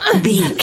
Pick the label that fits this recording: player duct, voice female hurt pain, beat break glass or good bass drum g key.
voice female hurt pain